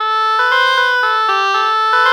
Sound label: oboe oboe lpc false melody morph